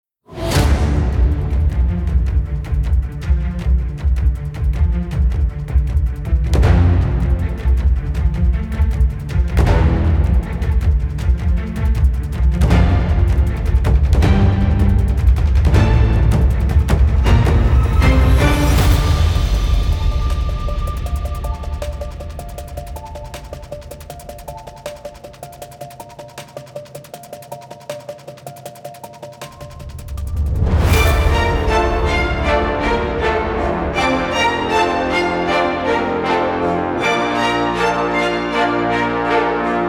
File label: instrumental